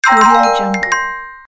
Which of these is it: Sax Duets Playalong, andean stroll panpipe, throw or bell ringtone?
bell ringtone